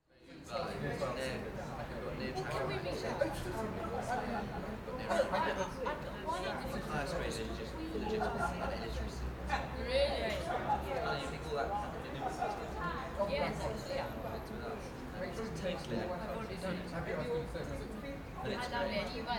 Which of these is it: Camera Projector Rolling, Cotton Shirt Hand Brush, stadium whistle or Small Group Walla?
Small Group Walla